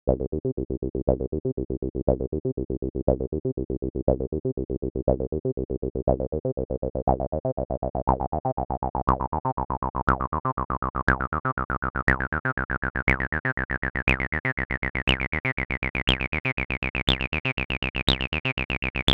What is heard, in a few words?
ACID V Saw; ARTURIA